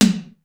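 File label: mid super tom, sr16 tom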